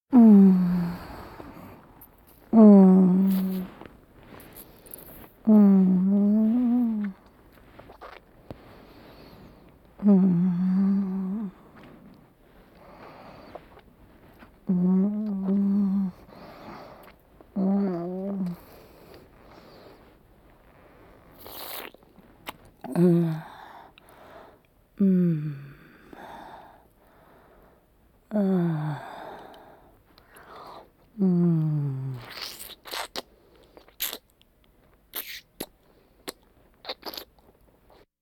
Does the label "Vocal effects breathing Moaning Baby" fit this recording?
Yes